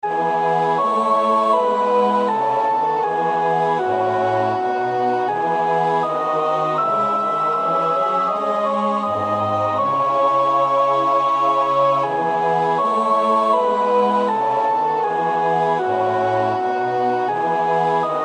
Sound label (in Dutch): CHORALE koor sopraan, koorpartij; BWV 244 Matthäus Passion; No 15. CHORALE- Erkenne mich, mein Hüter (koor 1 en 2)